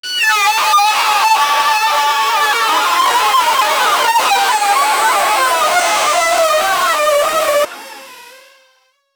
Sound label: baby poop seat